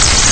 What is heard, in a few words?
blow nose; AFL Masters